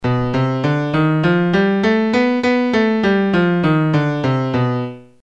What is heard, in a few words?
altered scale